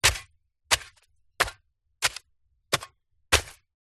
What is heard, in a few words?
industrial shovel spade dig ground x6